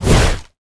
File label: Death Wall Interior Start; Evolve; Elder Kraken